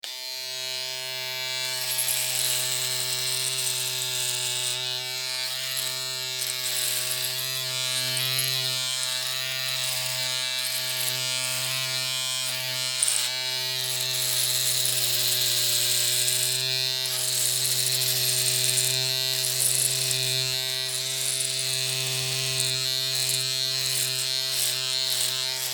Shave Razor

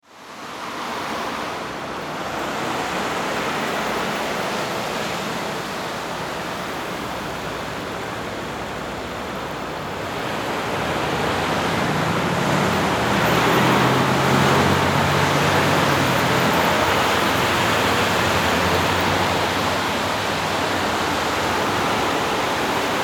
Traffic City

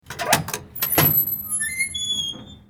Door

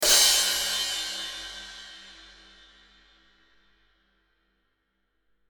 Rimshot crasher

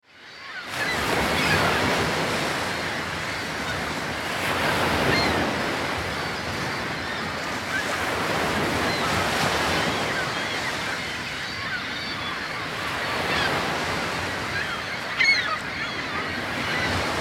Water Ocean

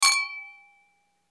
Glassware Toast

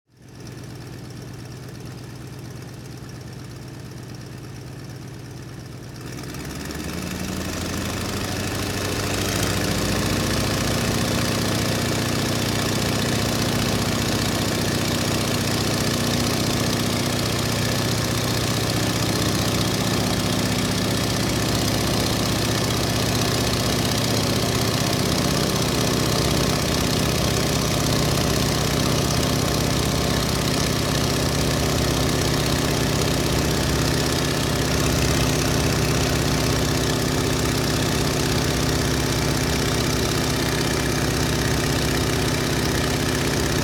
Airplane Biplane Take-off

